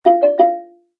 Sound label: lock error chime; nest yale lock